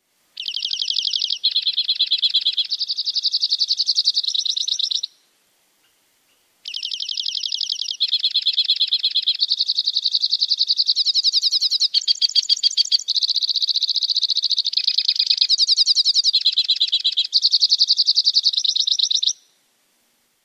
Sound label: song